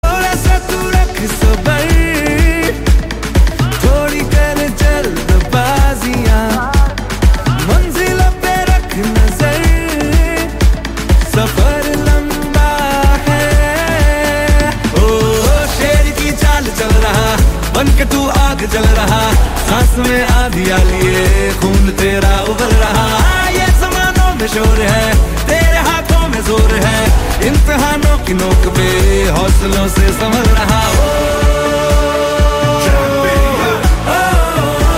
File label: Hindi Ringtone; Chandu Champion